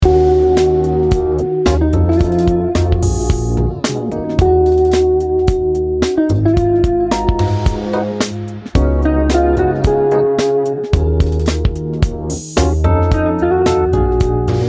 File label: Sunny Jazz Guitar